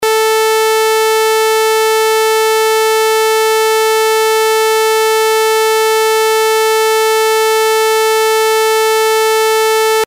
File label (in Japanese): トーン のこぎり波